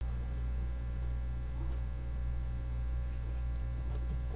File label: beat